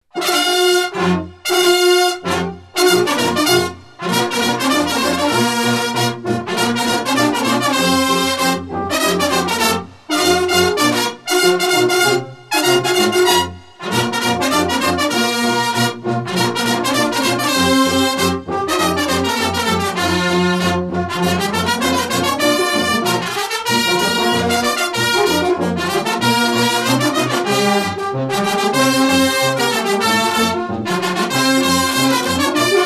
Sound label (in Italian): inno dei bersaglieri ciclisti